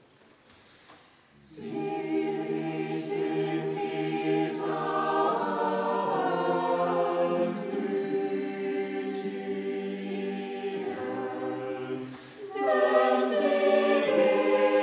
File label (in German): Chor; Selig sind die Sanftmuetigen